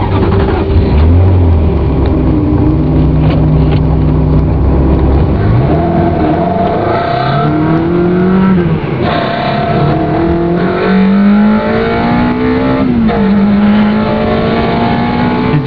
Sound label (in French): 360 - a bord, ferrari